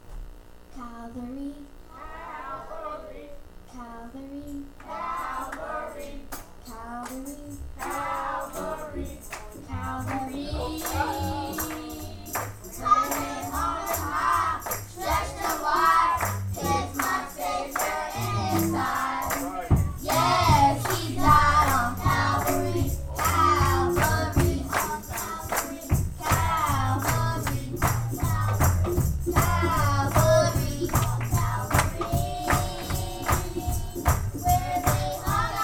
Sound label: Children Singing